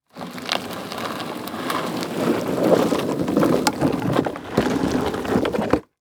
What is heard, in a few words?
Impact Plastic Drag On Dirt Long